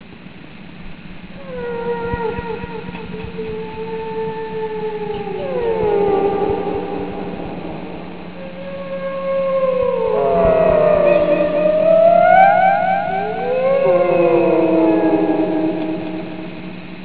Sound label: whale